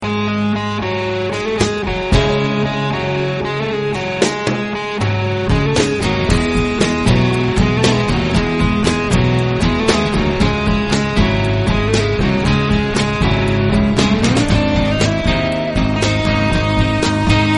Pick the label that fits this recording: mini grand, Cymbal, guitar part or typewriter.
guitar part